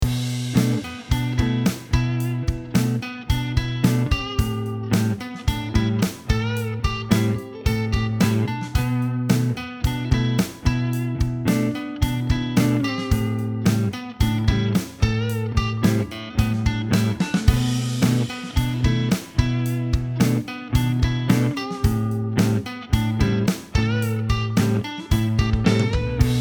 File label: rock